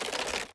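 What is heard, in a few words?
inspect movement, weapons; cstrike; valorant; araxys operator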